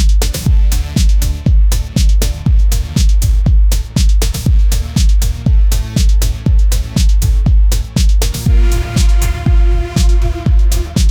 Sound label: disco